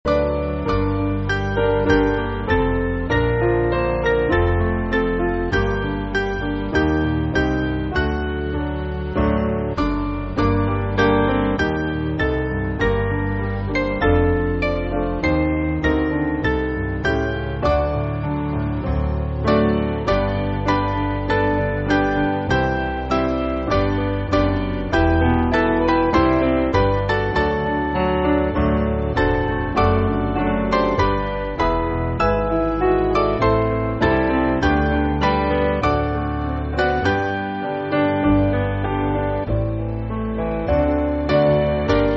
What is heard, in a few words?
For Ages Women Vox Dilecti Piano